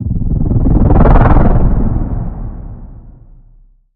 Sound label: Ride The Transition